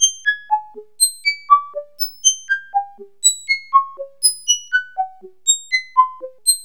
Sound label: SPACY NOTES, SPACY SEQUENS; Zero-G Ambient 1